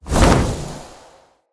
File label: Casting Device Cast Left; Galene